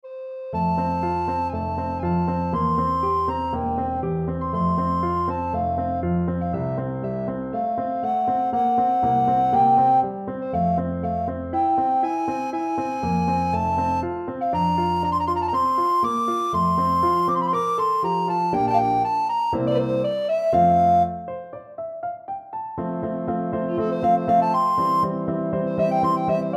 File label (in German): Klangbeispiel Blockfloetenfassung